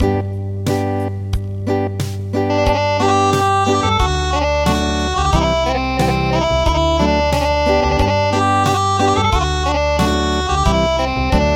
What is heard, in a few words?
Acoustic